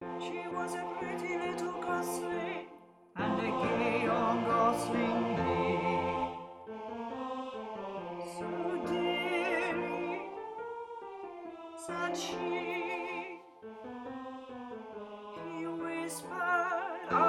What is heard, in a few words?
BAS